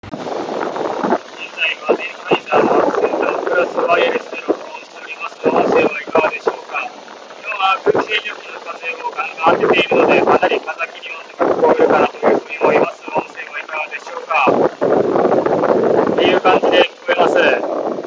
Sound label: SENNHEISER ACCENTUM Plus wind